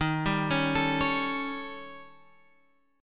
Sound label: D sharp 7th Flat 5th, D sharp, down, Guitar, Open G